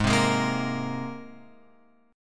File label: G sharp Major Add 9th, chord; G#add9 Chord {4 3 6 3 X 6} strummed